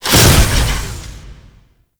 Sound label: Necramech Ranged Burn Out Start; Enemies; Warframe